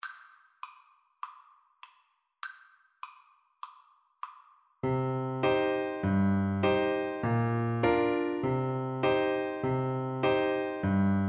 ugros, Trumpet

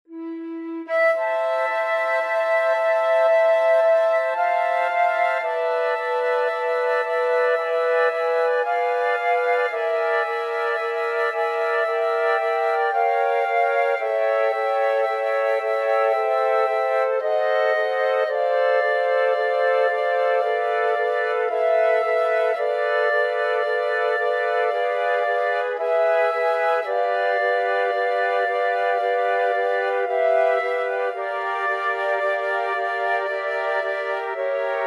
flute quartet

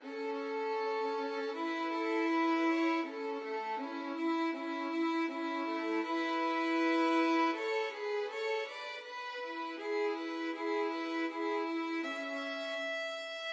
Mozart violin duet

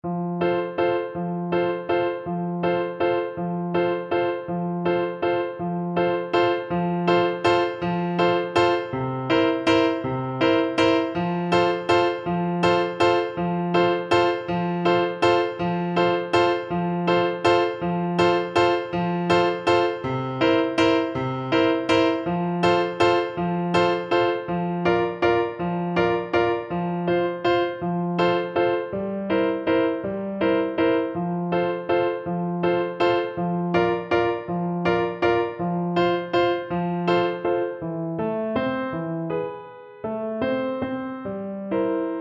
Bassoon; verdi; traviatta

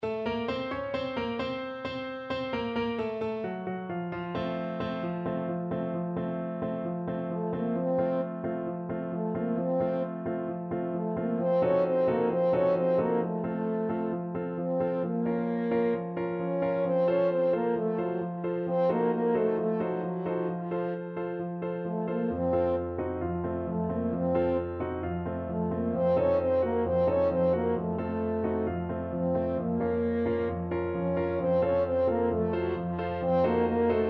a ya zain, french horn

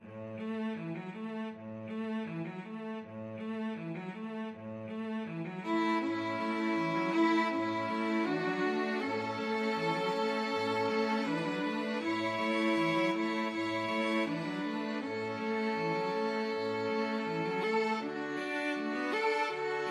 2 violins cello

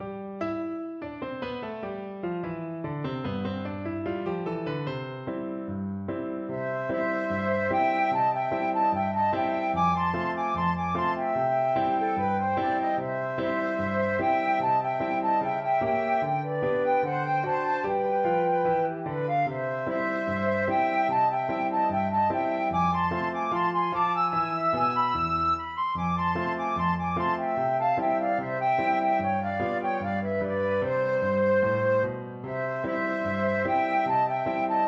the easy winners, flute

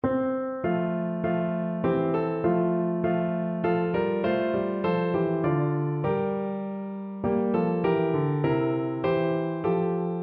piano

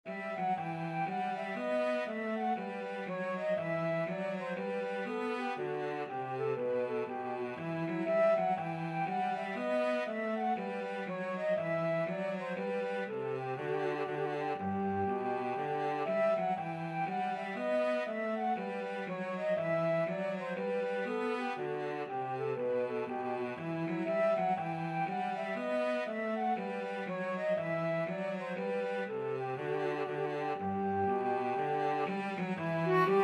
flute cello duet

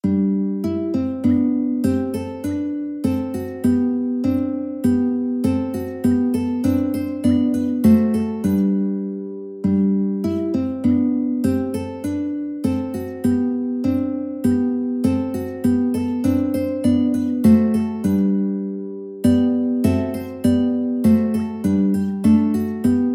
Gavotte, mandolin; Bach; BWV808

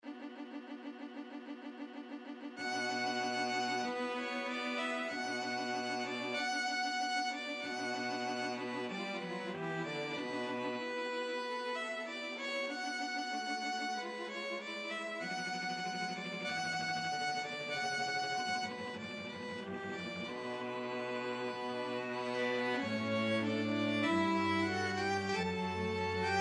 string quartet

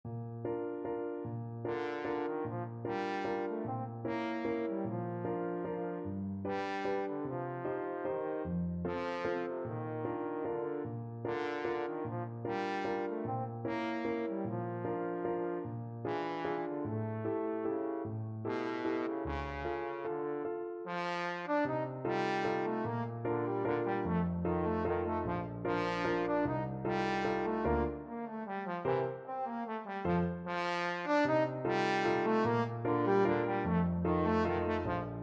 waltz, trombone

